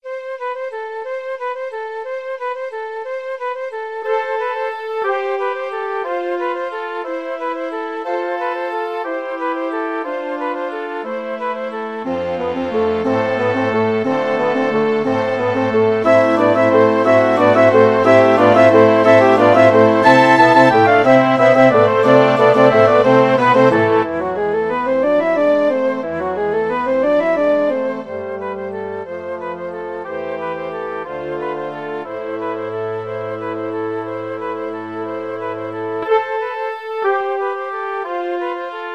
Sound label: carol of the bells, orchestra